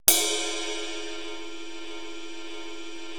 80S DRUM SET

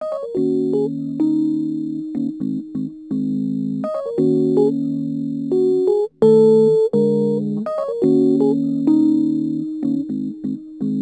Rhodes Attacks; Ueberschall

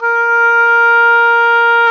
oboe, Oboe Brth

